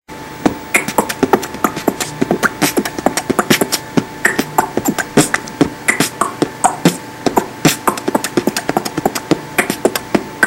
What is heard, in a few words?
beat, beatbox